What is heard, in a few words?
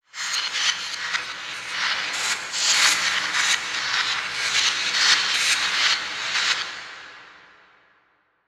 Title Transition Reverse; Production Element